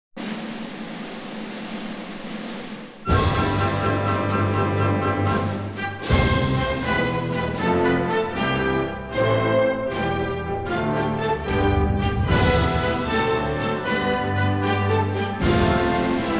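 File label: anthem of Kuwait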